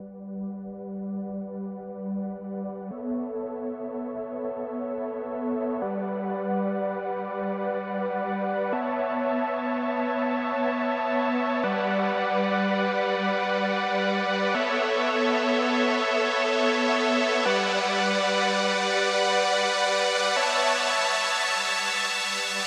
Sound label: 165 BPM B Min Chords; Cymatics; Destiny Gold Expansion Stems; For The Night